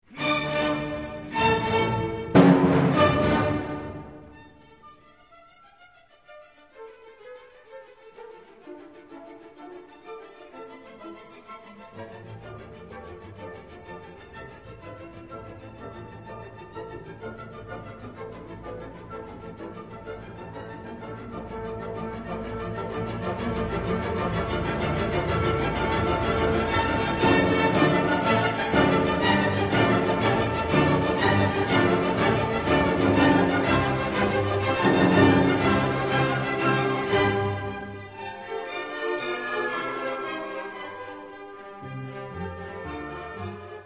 Symphony in D Minor Choral, Scherzo

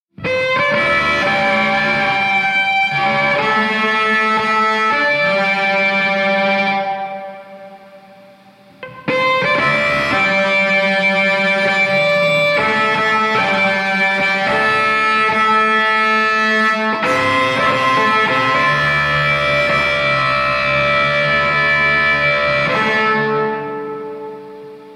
Violin Study

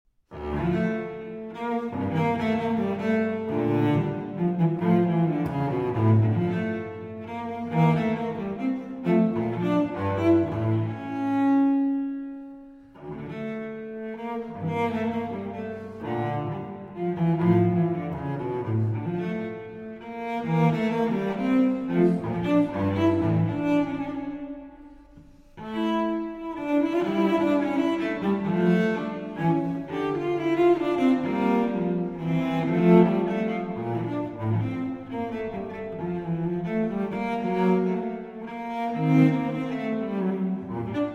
Cello Suite in D Minor; J.S. Bach; Cello Suite No. 2; BWV 1008

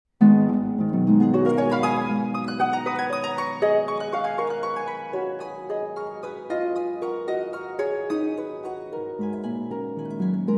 Sonata For Harp, Lebhaft

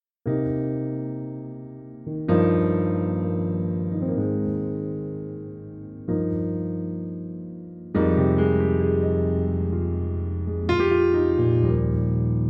Piano Version

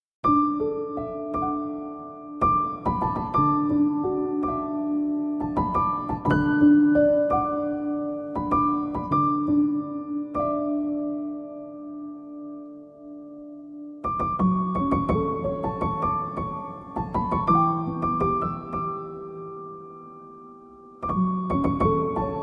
(Arr. For Piano)

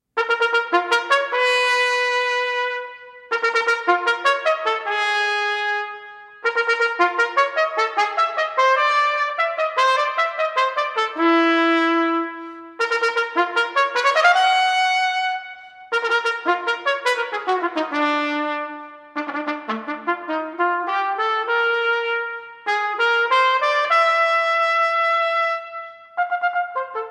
Suite For Unaccompanied Solo Trumpet; I. Introit

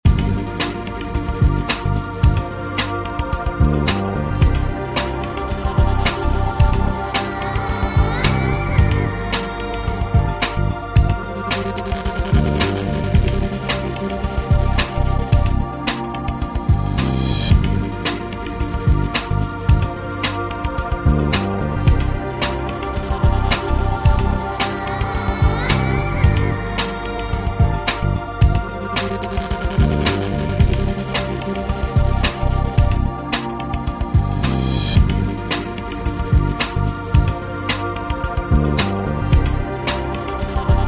Chill Asia Soundscape

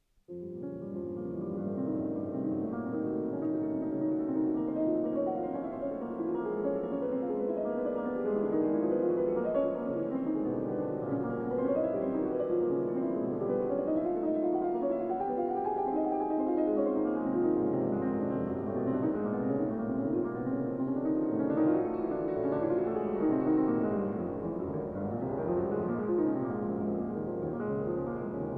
Piano Sonata No. 2 in B-Flat Minor; Op. 35; 4. Finale

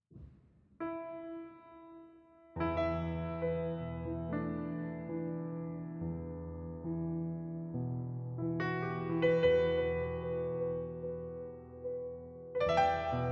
Easy Listening Jazz

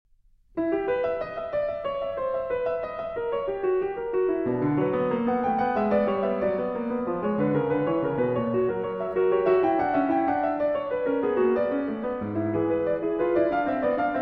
Fugue in E Minor

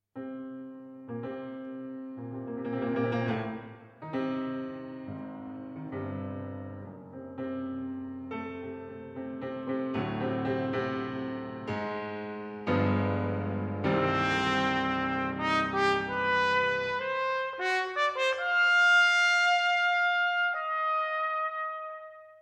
Trumpet & Piano, Allegro; Trumpet Concerto in C Minor, Op. 113 Poème; I. Allegro Dramatico

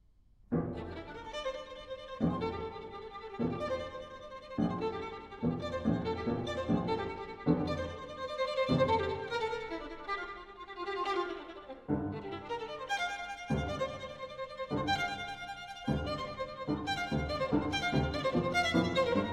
Suite For Violin and Piano, Moto Perpetuo; Op. 6; Allegro Molto e con Fuoco

